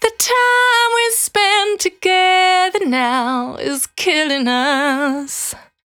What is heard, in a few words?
Cry 68bpm B, Vocal; Zero-G